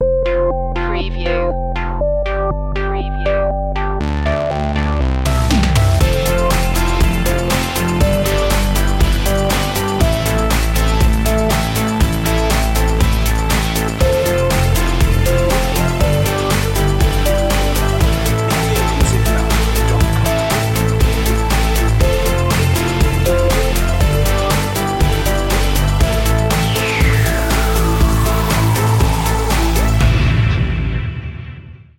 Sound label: happy uplifting